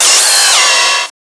SUPER BEND, PHUZZ DRUMS; Spectrasonic Distorted Reality 1

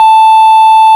ORG Farfisa Clarinet A 5

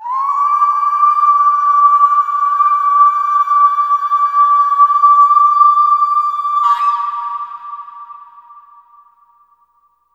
BERBER YEL, FEM. NOISE; Spectrasonics; Heart of Africa

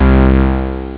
bass string; Amiga Soundtracker Sample Packs

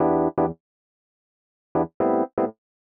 120 Rhodes E, Keys, Nu Jazz House; PowerFX

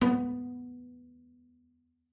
B3, bass pizz; Xsample VOL-5

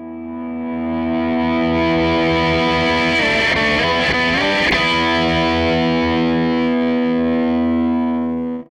Gretsch Guitar Loop

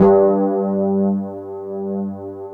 WET RD G1 R, Keyboards Old School; USB Soundscan vol.09; AKAI; WET ROAD

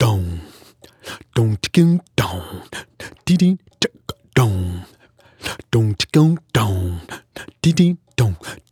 African Mouth Groove; Continental Drift Aftershocks; Vocals

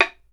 BAMBOO, World Assortment

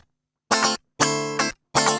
GUI 120 5aD; Best Service Gigapack 2

